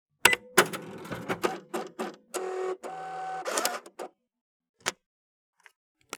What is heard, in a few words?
register; ちょっと古めのレジ音（2）